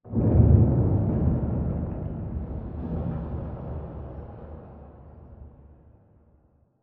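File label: Explosion Rumble Exterior Distant Tail; Boomtown; Titanfall 2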